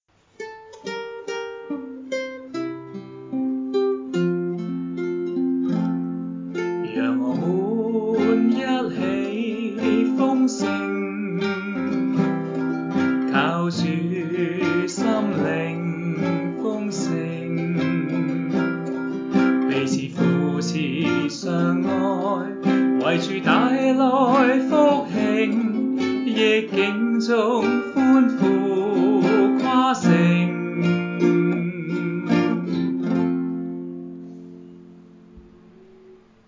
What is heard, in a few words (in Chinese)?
Phone Sing